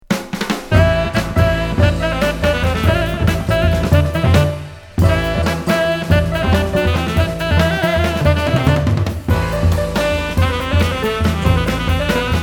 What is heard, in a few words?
oriental wind